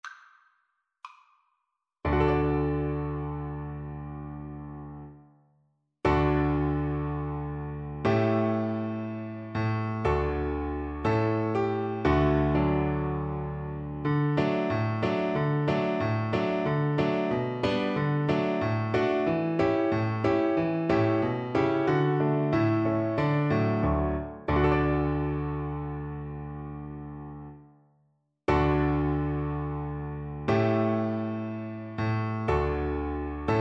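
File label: violin karaoke; children go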